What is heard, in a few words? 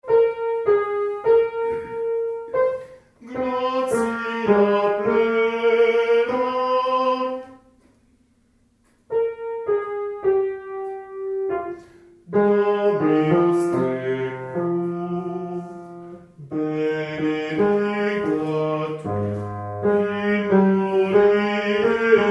Basses; Ave Maria